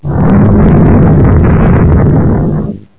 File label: earthquake rumble